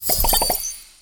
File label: daily bonus open